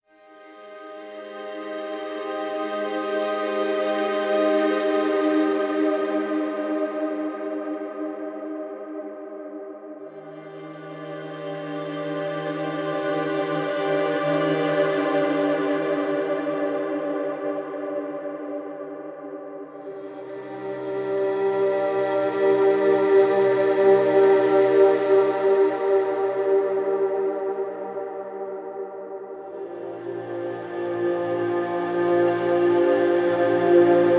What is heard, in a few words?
Spa Music Relaxation Sleep Meditation Sleep Waves